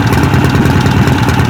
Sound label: idle, sled; WhiteOut